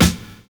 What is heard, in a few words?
DISCO SD, DISCOKIT; USB Soundscan vol.11 - Drums Dance & Groove; AKAI